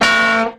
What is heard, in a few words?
Bonk; Soundpad